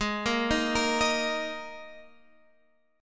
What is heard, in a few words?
G sharp Suspended 2nd chord